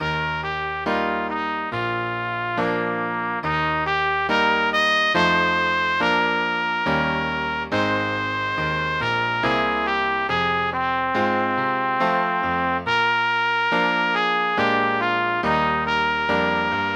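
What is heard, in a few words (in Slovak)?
Keby som bol vtáčkom - pre trúbku a klavír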